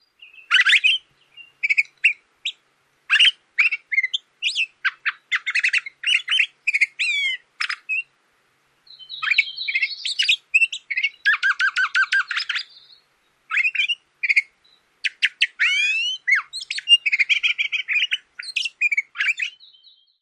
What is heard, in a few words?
extended whisper song; CA